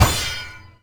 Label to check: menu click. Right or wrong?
Right